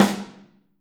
Snare OH; Snare OH All; Drums Percussion

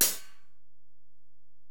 HiHat, Alesis DM-PRO

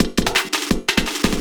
LOOP, 170 BPM, Drum & Bass

